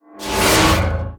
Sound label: Animated Shield Attack